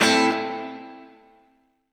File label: airborne go reverb; harley benton